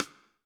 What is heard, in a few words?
Snare, Roland TD-20